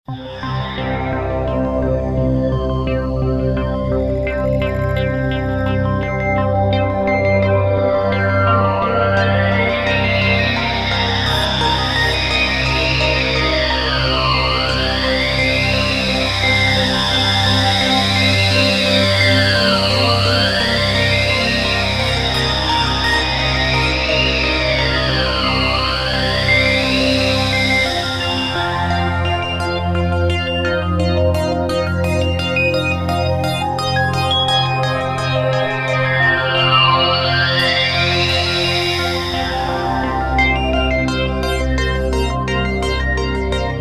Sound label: Dark Techno City Looping; Arcade Moonlander